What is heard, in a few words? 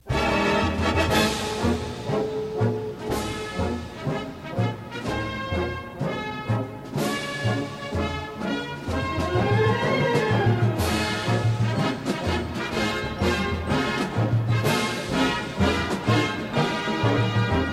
Band - March Swing